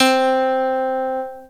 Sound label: C3 ELGPNO